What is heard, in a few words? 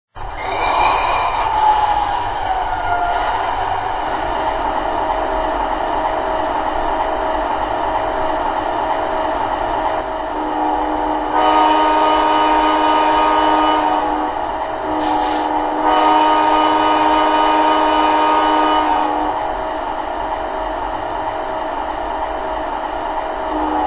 genesis; amt